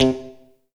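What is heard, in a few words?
GTR, guitar, funk; USB Soundscan vol.47; AKAI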